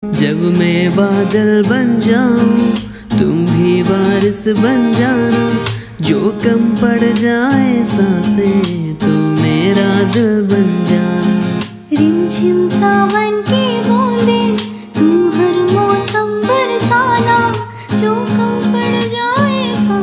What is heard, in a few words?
Cover Version Ringtone